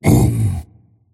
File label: pain; dota; tiny